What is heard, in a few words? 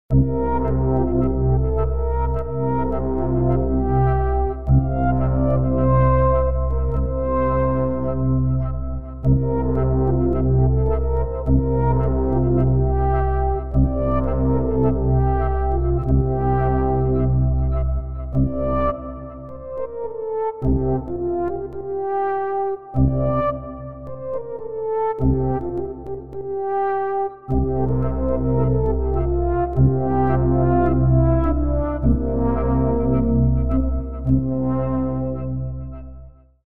irish band